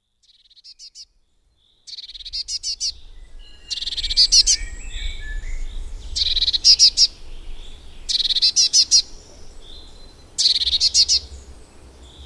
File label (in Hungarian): Kék cinege